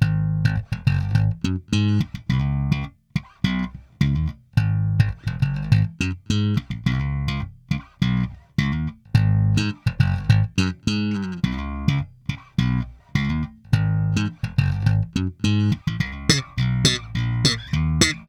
Bass loop StandUp 105 Abm, Electric Bass Loops Direct; Slate Digital BASSHOLE Sample Pack